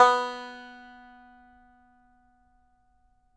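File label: banjo B3 open, banjo notes; Sampleheads - Whole Lotta Country 1 & 2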